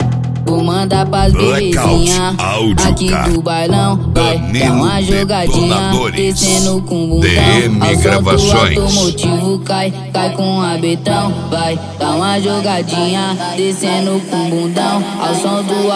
funk house remix

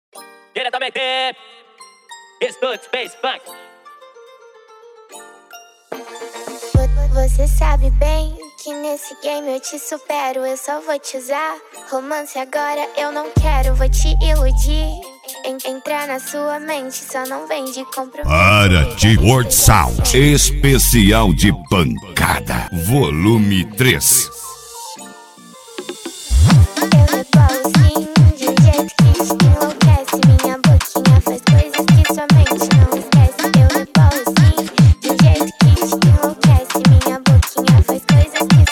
esp pancada

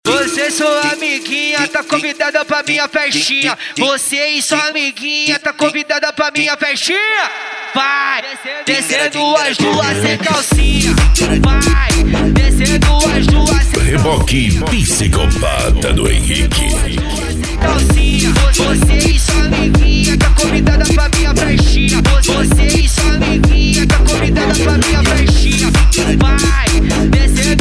eletro funk

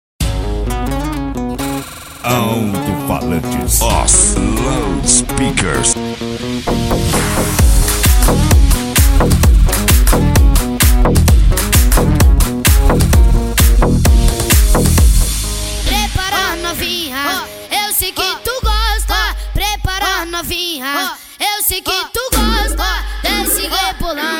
mega funk

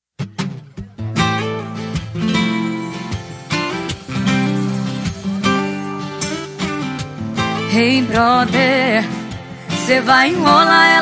eletro funk e sertanejo

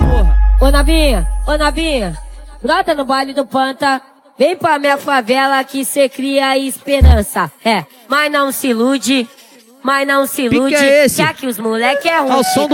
funk sertanejo

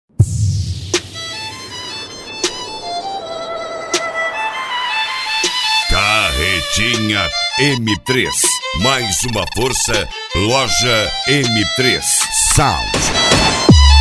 violino; carretinha m3

